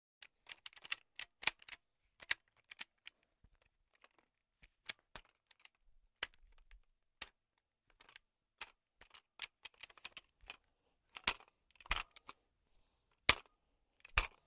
12 Gauge Shotgun: Pump Action; Series Of Slow And Fast